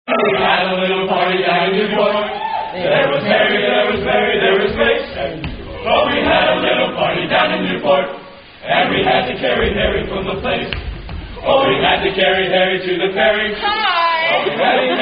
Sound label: Drinking Song, Men's Octet